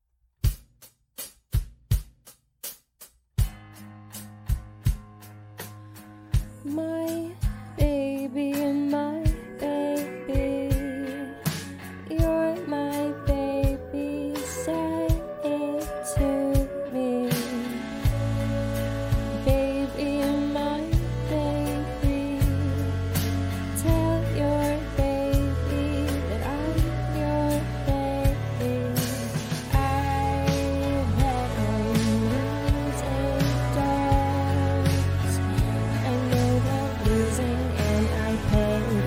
karaoke

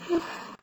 Moan

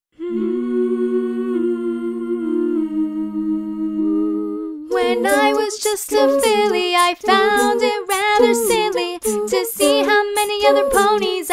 Acapella Cover

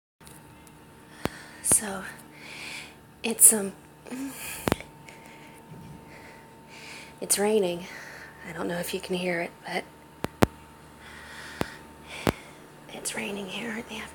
Thunderstorm

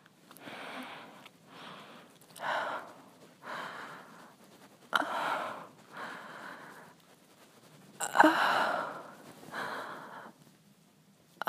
Self moans

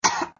Cough; Lewd SFX

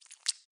Slide; Lewd SFX

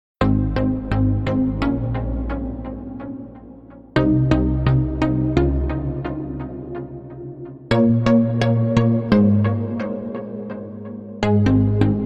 Brazil Pluck Reverb

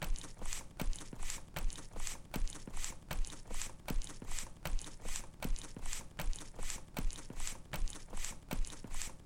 milking machine; Erotic audio

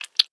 Sex Slide

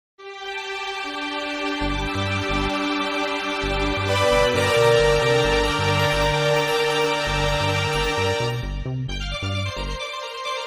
Piano

